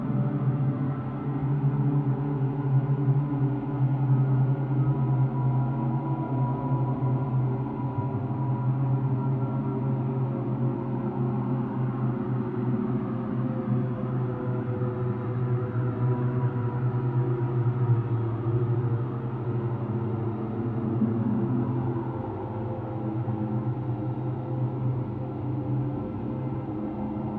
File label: Scary Ambience; Orcs Must Die